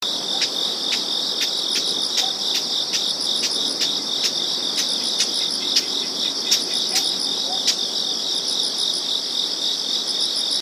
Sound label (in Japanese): uguisu; ウグイス; 地鳴き; 地鳴きは「チャ チャ」あるいは「ジャ ジャ」とも聞こえます。; 南房総の野鳥